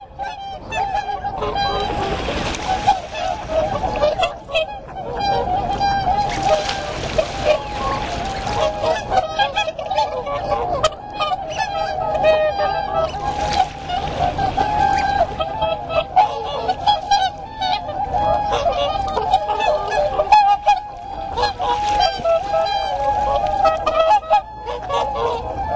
whooper swan

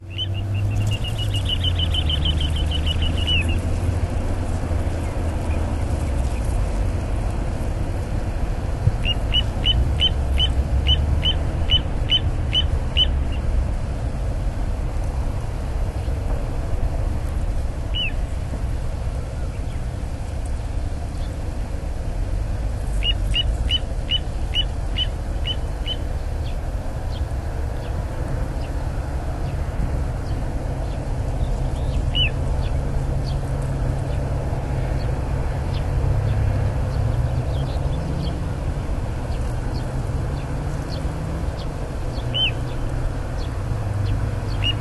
little ringed plover

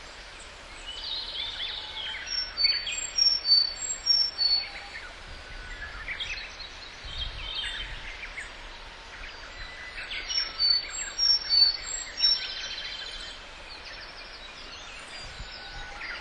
palelegged warbler

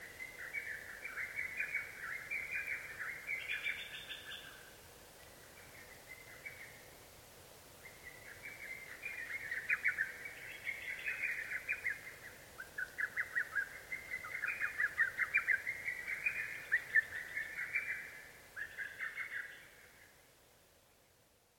little cuckoo male

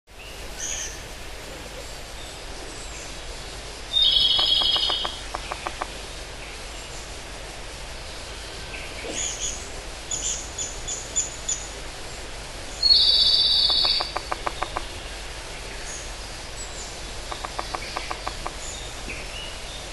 pygmy woodpecker

